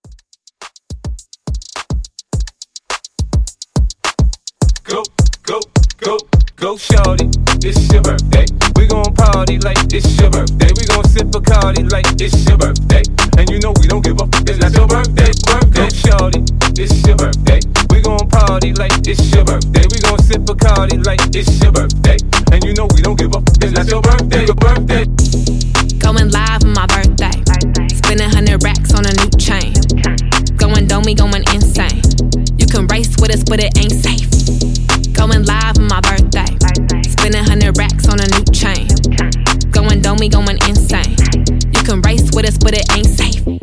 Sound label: 105 clean